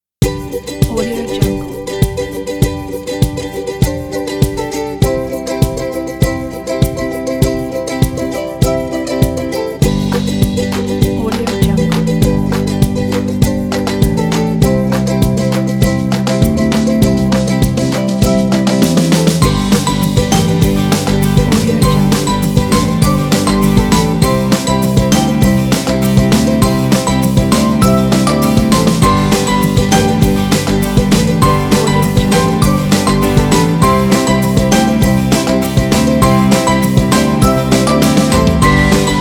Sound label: happy summer ukulele